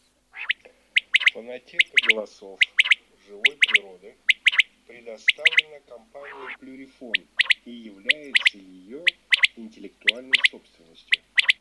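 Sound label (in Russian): Перепел Quaglia, chip mini для манка; plurifon; chip mini 18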